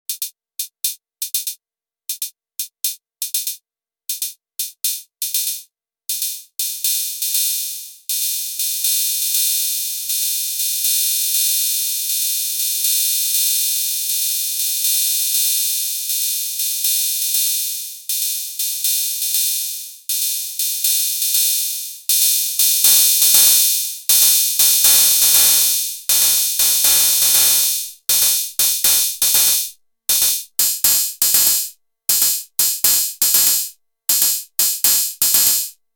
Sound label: Open Hat; Arturia DrumBrute Impact